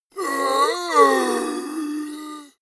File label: monster growl hoarse; comedy-cartoon; soundpool